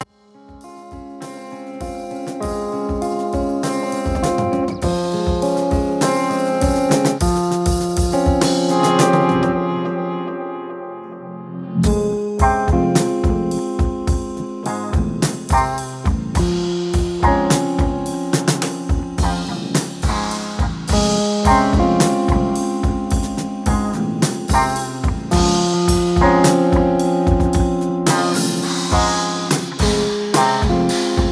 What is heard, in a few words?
chord jam; fragmental shortcuts